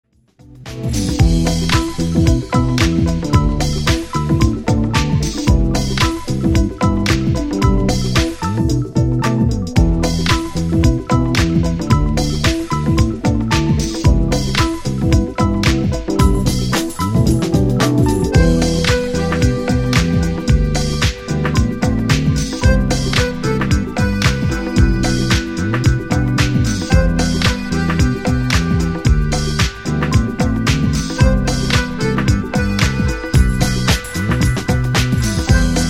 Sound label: Instrumental